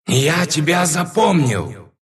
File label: death ru